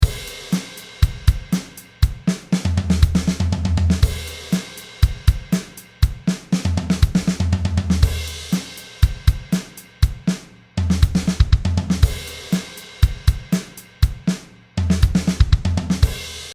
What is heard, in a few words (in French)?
fill 3 en un